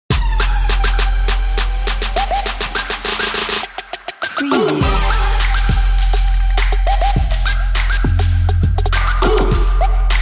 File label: trap beat